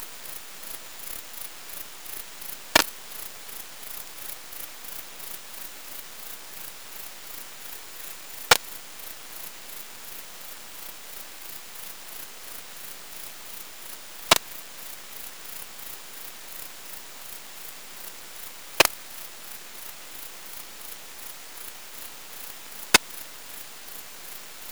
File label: L albovittata